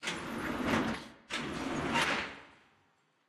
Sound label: splash Screen Plate Close; cashomatic